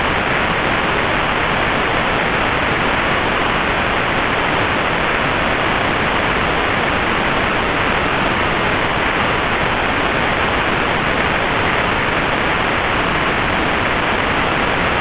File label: noisy